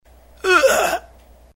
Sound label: pain